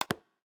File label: Lock